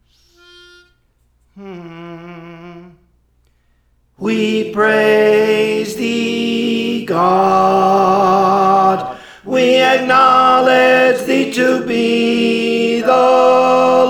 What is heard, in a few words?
Tenor; Praise For The Lord Training